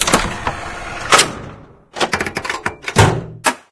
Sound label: gear engage lever; video games; ambient; source engine; portal2; outland